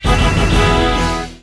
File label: Jingle Power Up; rayman 3 hoodlum havoc gc ps2 windows xbox 2003